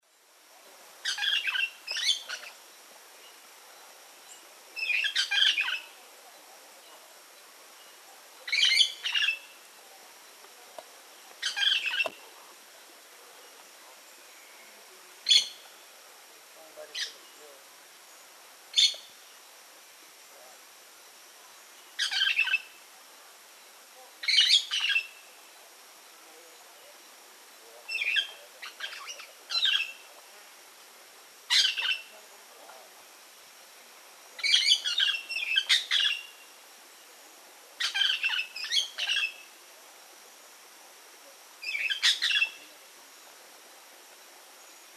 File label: brown hooded parrot, Belize birds birdsongs